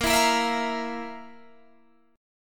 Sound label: F Major 7th Suspended 4th Bb